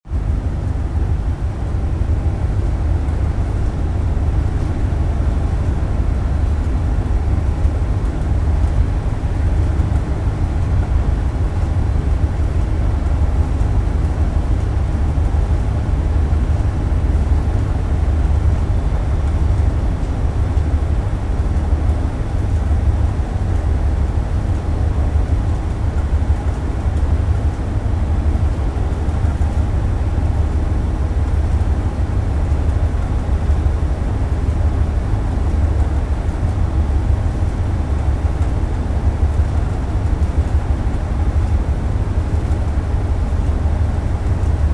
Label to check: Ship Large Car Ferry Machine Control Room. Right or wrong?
Right